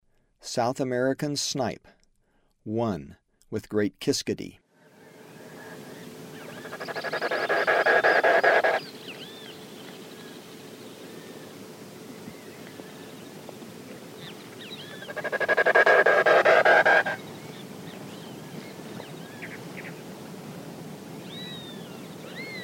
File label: South American Snipe